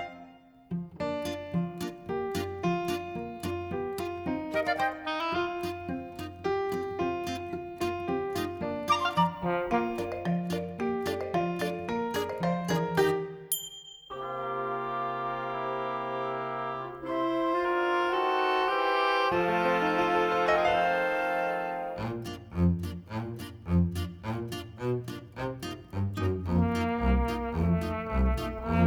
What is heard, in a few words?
Audition Cut Instrumental Only